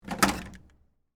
Arcade Game Pistol, Technology, Arcade, Game, Pistol, Revolver, Remove, From, Holster; Arcades